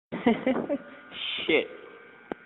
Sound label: Hihi Cool